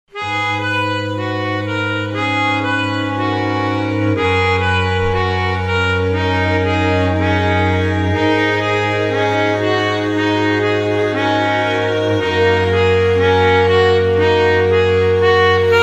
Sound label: kissing